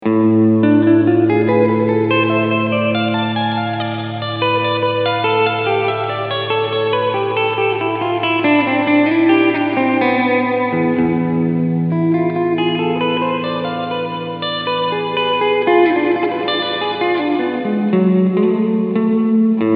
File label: JHS Pedals 3 Series Hall Reverb Cleansound; Dampen 0; Decay 1 Uhr